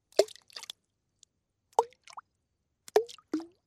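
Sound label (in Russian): блинчики по воде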